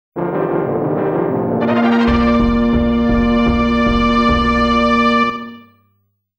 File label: Opening Fanfare; Super Donkey Kong